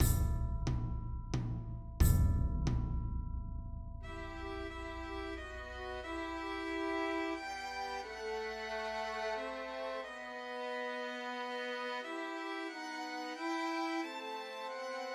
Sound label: Trios for Three Violins; Coventry Carol; Christmas